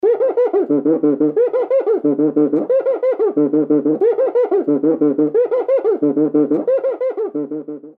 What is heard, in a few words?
cuica; lateinamerika